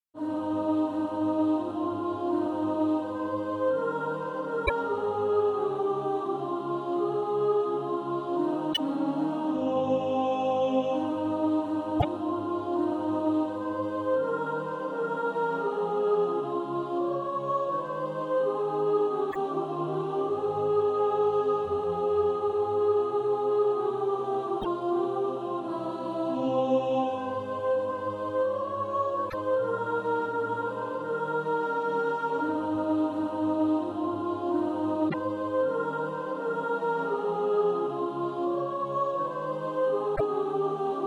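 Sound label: soprano; Love divine all love excelling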